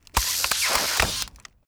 electric

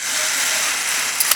Venetian blind down

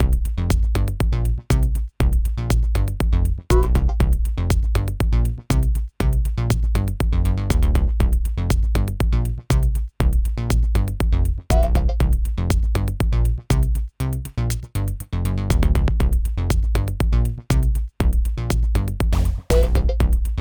BGM rhythm; game builder garage 2021 switch; Node 07

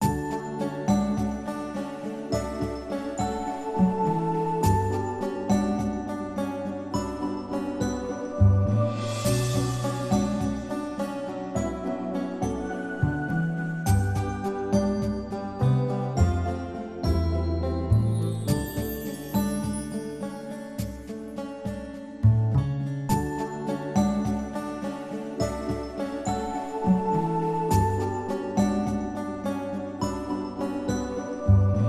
Store Bgm; My Little Pony Magic Princess

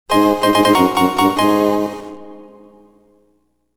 Fanfare- Item Obtained; pokemon firered leafgreen music super complete

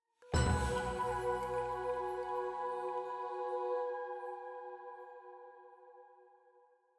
item Jingle Full Choir Flute B

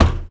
footstep metal low run; SFX; Environment; MONO; Footsteps; ProSoundCollection v1 3

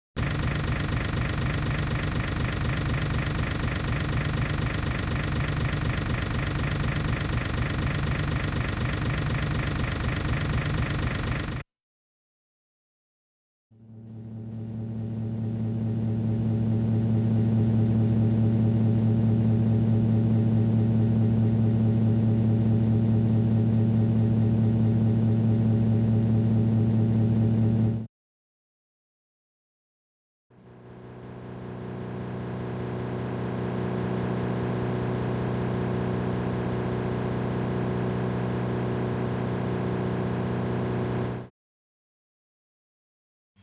ENGINE on, 4WD COUPE F-TYPE 2WD TRAILER

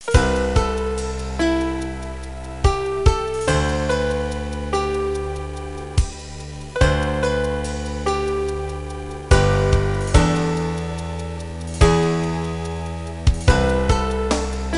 BGM Menu; Simple DS Series Vol. 47 The Suiri Shinshou 2009; NDS

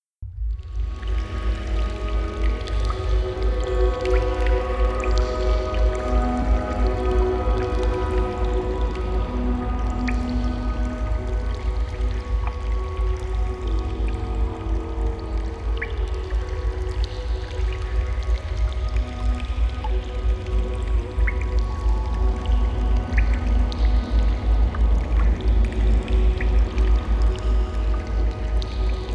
Swamp Ambience; monster hunter complete soundtrack

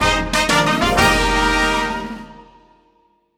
Level Up Fanfare; Kirby Fighters 2

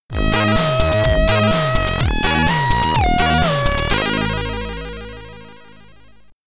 Jingle, SID Stereo; Magic Johnson's Basketball; commodore 64

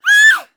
Female 36yo Scream; mono

